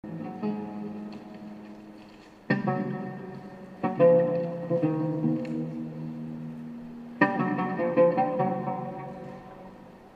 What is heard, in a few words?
loop